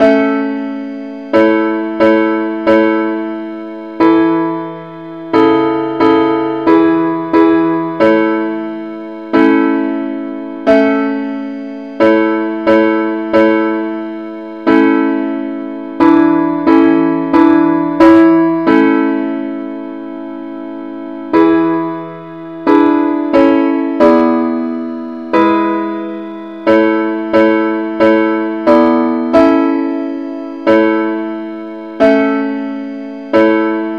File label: parts only key a